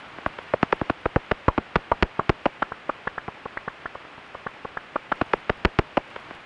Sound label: Common pipistrelle; Jules Agate